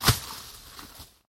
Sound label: tree break